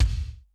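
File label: GATE KICK; Crypton - DrumBase5000; GATE KITS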